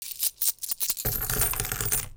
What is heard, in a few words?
Money